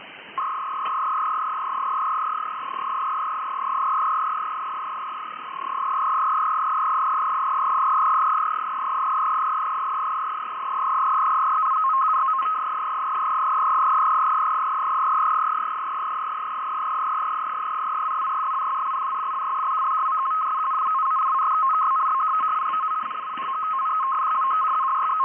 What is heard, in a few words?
Algerian Air Force 75Bd / 300 Bd / 200 Hz BELL 103 COMPATIBLE FSK MODEM; Station sending long data burst with 75Bd; FSK-systems